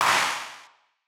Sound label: Wider Clap; stereo toolkit